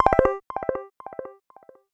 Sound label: Vibe Win Transfer Stop; JackpotDarts; ClubFusion